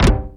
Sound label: twank; MAGIX